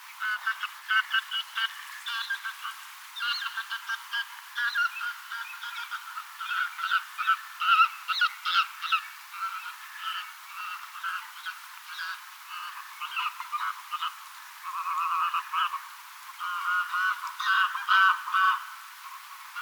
kiivasta pesima-aikaista kotihanhen aantelya; kiivasta pesimäajan kotihanhen ääntelyä

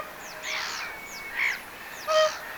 ilmeinen metsakirvinen

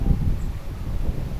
onko oranssisirkku

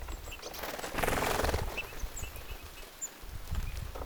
ilm punatulkkuja nousee tassa ruokailulta lentoon; punatulkkuparvi nousee ruokinnalta lentoon; Siellä satojen keltasirkkulintujen paikalla

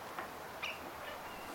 voiko tuo olla kivatviklo; kivatviklo ilmeisesti

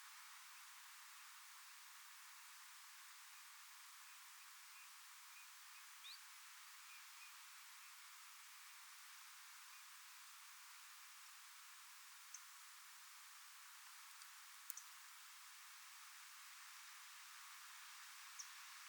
vahan hiljaisia pikkulintujen aania

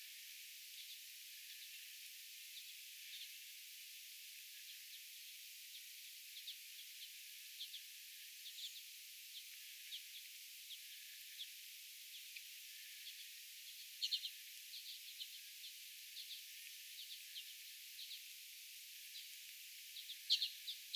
urpiaisten aanta lintutornipolun varrella

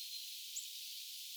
onko talitiainen hiukan sellaisen pik-tiltaltin kaltainen aani

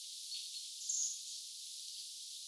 melko erikoinen tiaislinnun aani

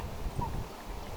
mika laji onko se laulujoutsen

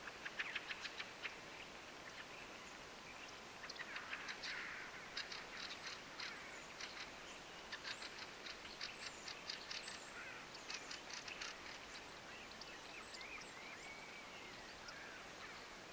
tuollaista rakattirastaan rahinaa

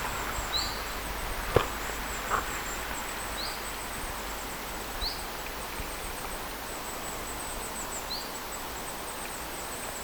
bizt-tiltaltti

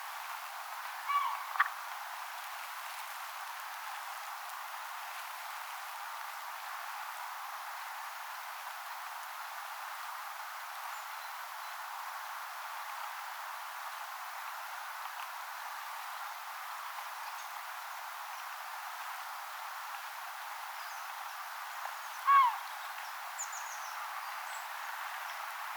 harmaalokki ääntelee jossakin taivaalla linturuokinnan yllä ruokinnan lähellä neljä käpytikkaa, harmaalokki ääntelee taivaalla, käpytikoilla on kevät, keväistä ääntelyä

